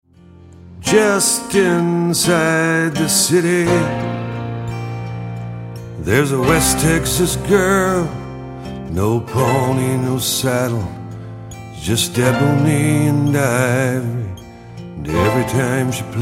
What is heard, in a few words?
BLUE PIANO